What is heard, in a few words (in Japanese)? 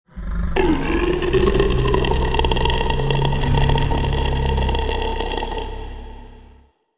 zonbi